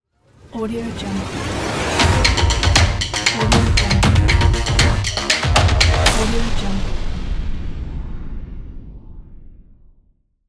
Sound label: cinematic action logo; macro-media